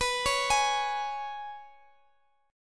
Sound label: G sharp Minor Double Flat 5th, guitar